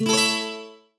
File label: 1up SFX; Adventure Time - Gate Crashers